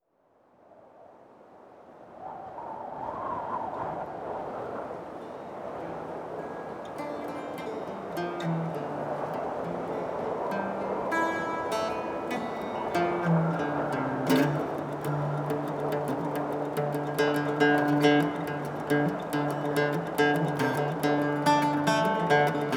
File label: mazurka instrumental